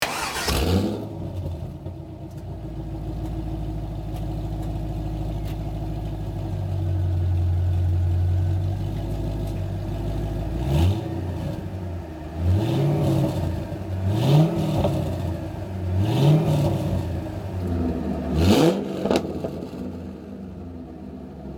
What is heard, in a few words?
corvette c5